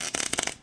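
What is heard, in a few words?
ambience interior arc; Tony Hawk's Project 8; XBOX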